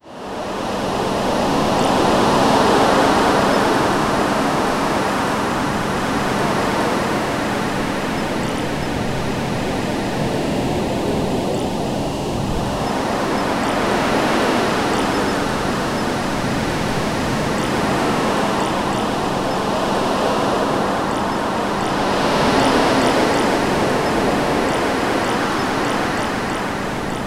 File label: the sound of the sea, beach